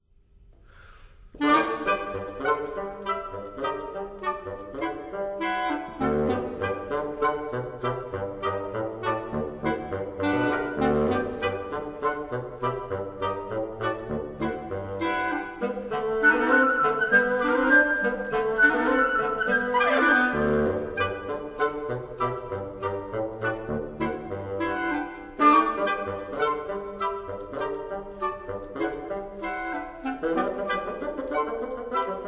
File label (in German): Holz; Der Bi Ba Butzemann; Moegling